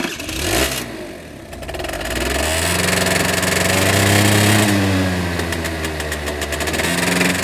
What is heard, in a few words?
start, motorcycle; 1000fx